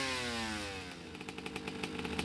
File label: Decel, Engine